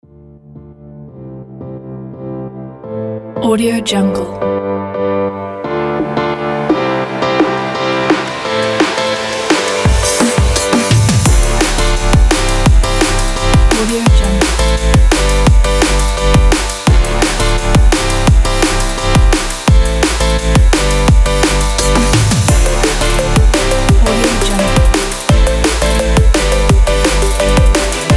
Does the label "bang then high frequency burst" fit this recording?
No